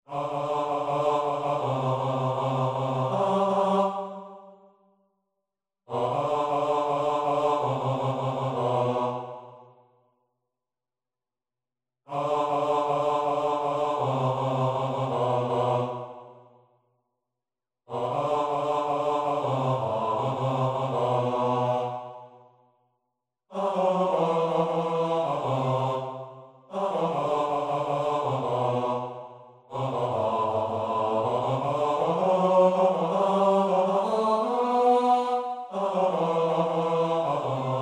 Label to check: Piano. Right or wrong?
Wrong